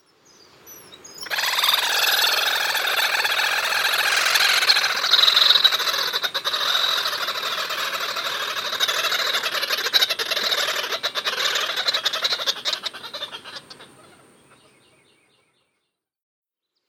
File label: Least Grebe